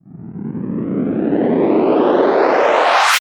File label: Sweeps Up, Effects